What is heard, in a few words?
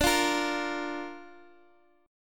D Minor Sharp 5th D down Guitar Standard; Dm#5 strummed; JGuitar